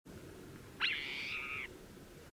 Towhee Green Tailed mew

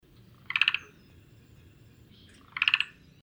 Cuckoo Black Billed Grate

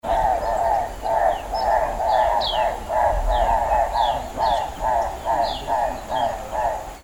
Hartlaubs Turaco

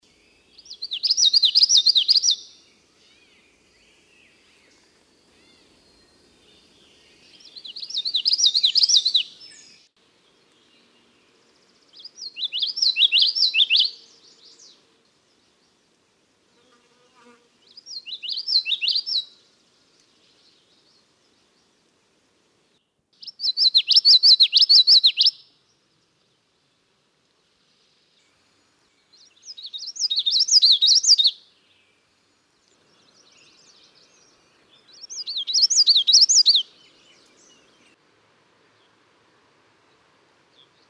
Yellowthroat Common Song

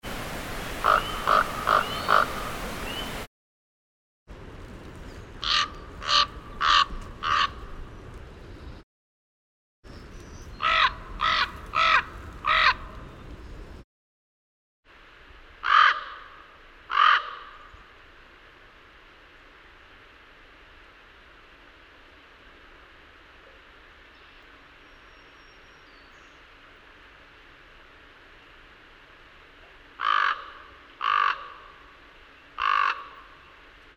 Raven Croak examples

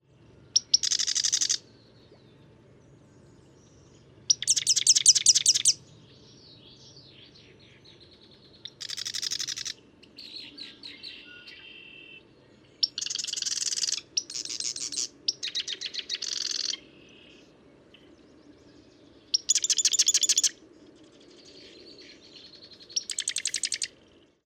Wren Marsh west

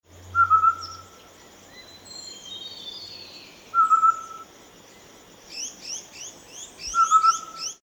Babbler Streak Breasted Scimitar

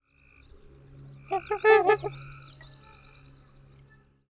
Swan Trumpeter

